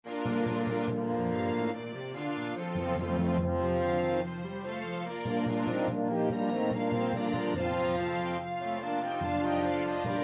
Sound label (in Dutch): bas, koorpartij oefening; Bach (Johann Sebastiaan); BWV 143